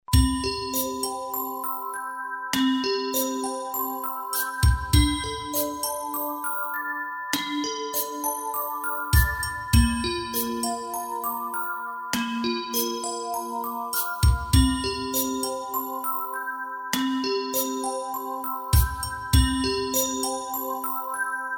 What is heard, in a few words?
MUSIC BOX